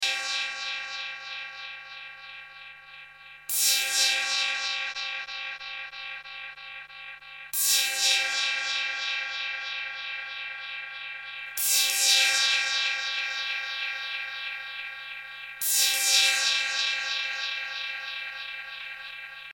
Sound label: korg 808 cymbal; shootout